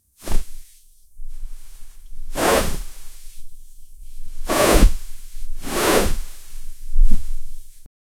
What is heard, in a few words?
heavy fabric sweep, cloth